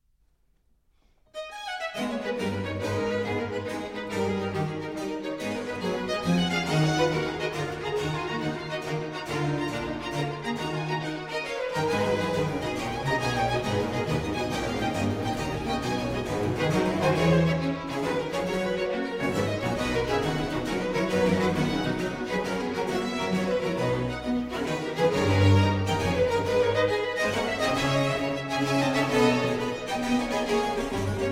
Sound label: Violin Concerto in A Minor, Allegro assai; BWV 1041